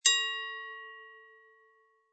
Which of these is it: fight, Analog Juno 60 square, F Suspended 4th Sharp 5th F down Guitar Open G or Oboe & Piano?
fight